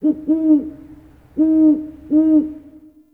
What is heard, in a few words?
Bird Owl Hoot; Warframe; Nineteen Ninety Nine